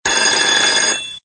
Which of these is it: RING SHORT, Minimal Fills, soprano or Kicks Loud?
RING SHORT